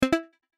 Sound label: Unlock